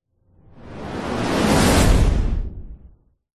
sound design cinematic building powerful whoosh

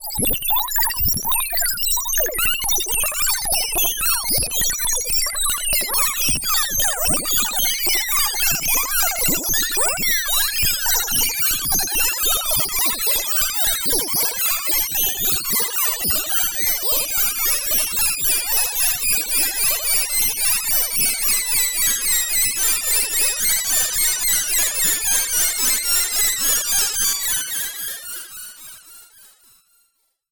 science fiction data teleportation fragmented

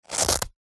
clothing bum bag belt velcro rip; zapsplat

